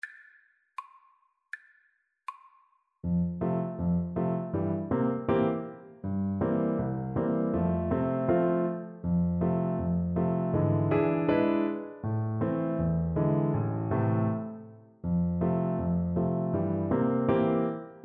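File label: pizzicati alto sax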